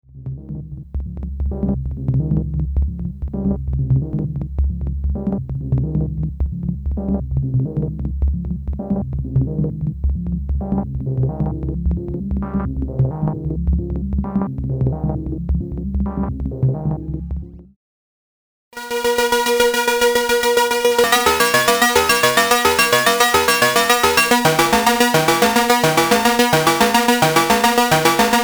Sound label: Moog Modular